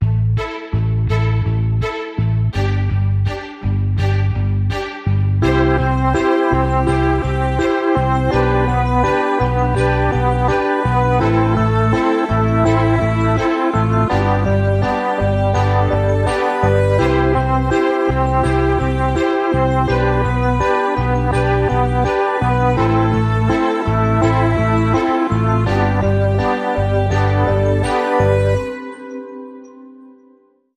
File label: No Drums No Melody